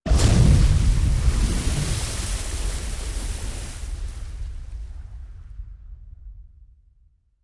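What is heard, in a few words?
Explosion Water Splash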